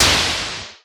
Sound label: noise fx; Masterbits; Switch FX